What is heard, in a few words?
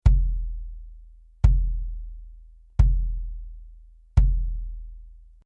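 BASS Ctd Reso Soft Felt, bass drum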